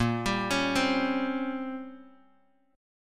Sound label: Bb Minor 6th Add 9th, Bass Standard; arpeggiated; JGuitar